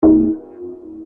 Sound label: error Open; Zero Online